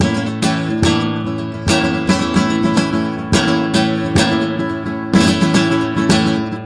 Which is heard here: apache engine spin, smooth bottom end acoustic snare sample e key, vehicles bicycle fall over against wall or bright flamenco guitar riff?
bright flamenco guitar riff